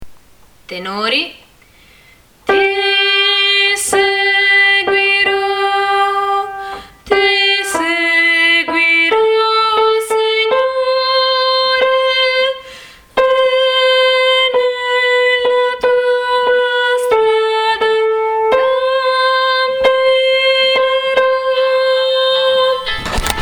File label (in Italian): Tenori